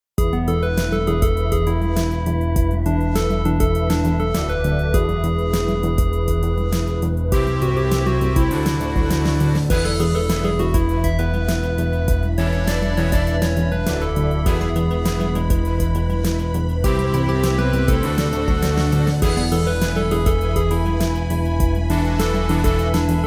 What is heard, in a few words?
Winning Tune; posh shop